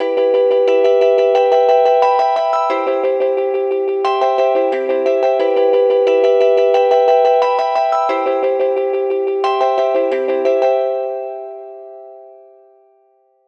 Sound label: Emin 89BPM; melodies